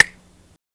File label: Cut Wire Snip, Bolt & Wire Cutters